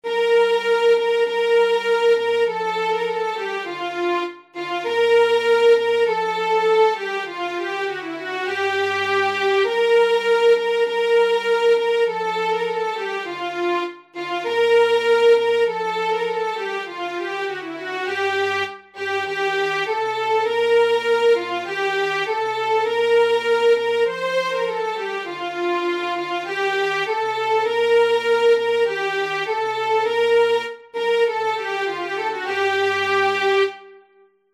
Tune; Pastime with good companie

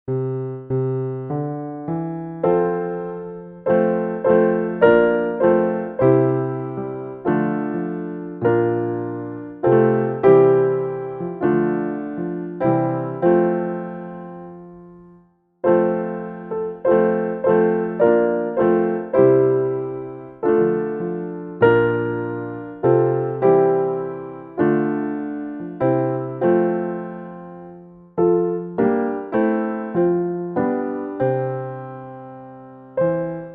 Piano; Es ist ein Ros

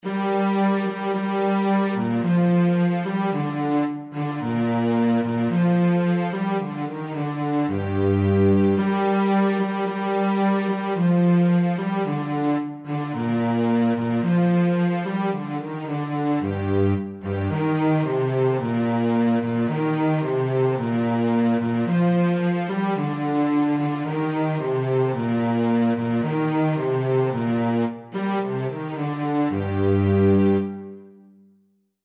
Pastime with good companie Cello

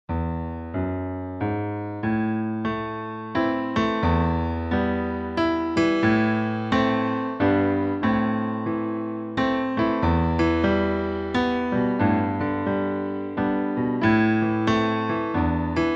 Piano; The Sheep under the Snow - Three little boats

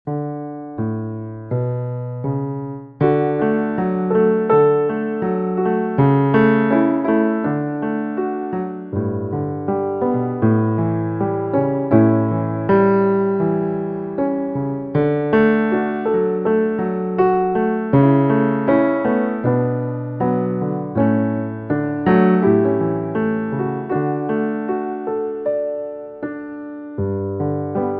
Piano; Largo from the New World

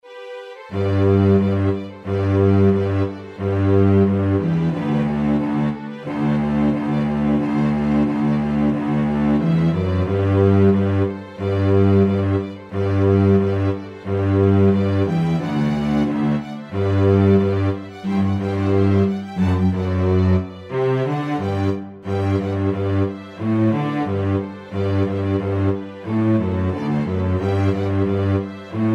Polkas Cello; Bert Jamiesons

